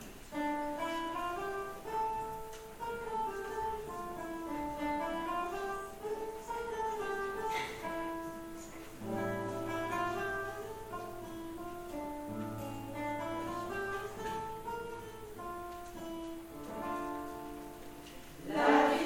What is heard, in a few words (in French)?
Chants, chorale